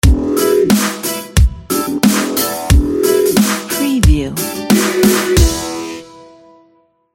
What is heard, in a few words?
ukelele dubstep logo